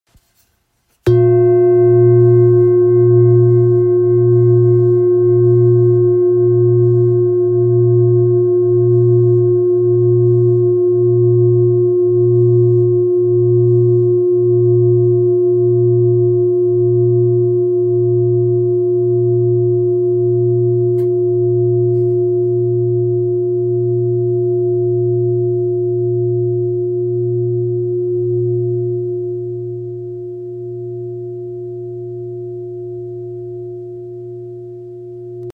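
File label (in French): Bol gravure arbre de vie fa diese, bol chantant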